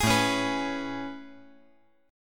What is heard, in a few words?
A Major 9th A